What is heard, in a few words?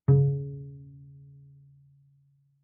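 Bass pizzicato fortissimo sul D Db3, Double Bass; MIS Pitches - 2014; Strings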